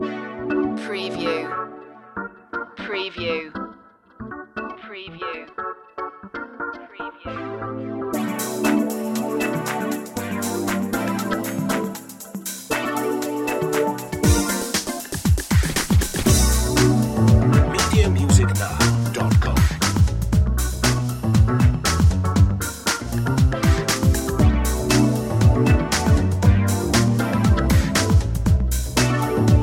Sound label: groove set loop